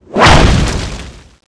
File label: whip sword stab loop